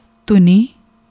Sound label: dhuni